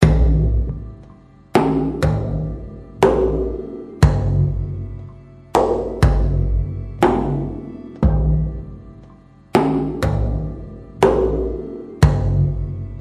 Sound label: Percussions Only